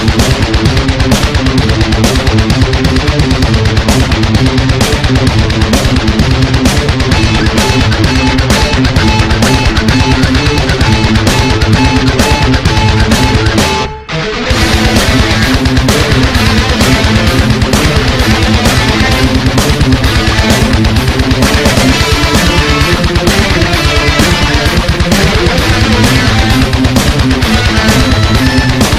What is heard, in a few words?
metal; xmen